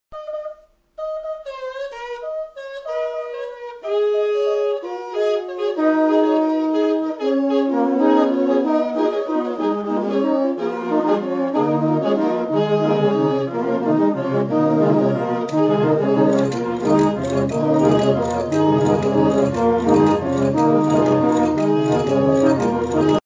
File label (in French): Trompes Banda Linda; Centrafique; Dangaye; Simha Arom